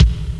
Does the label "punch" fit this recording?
No